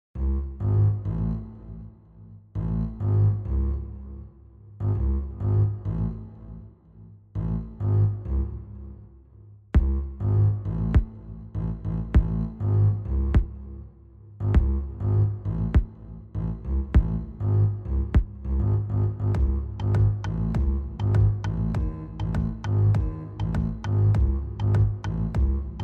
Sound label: strings double bass; captains strings